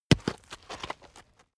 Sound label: stone drop